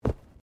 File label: Bodymovement Fall Light